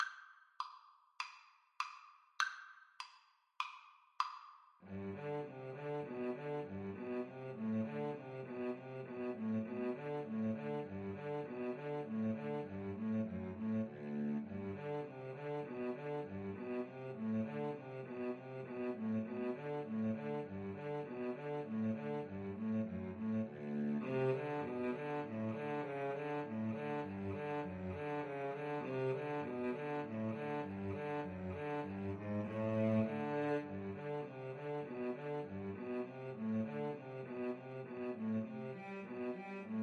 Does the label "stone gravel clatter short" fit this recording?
No